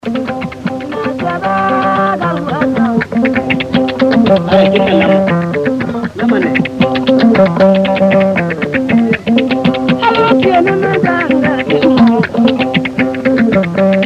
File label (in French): musique du gulmu